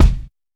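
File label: RAW bass drum, Drums Acoustic; AKAI; 90s sample CD; USB Soundscan vol.10; RAW KIT 8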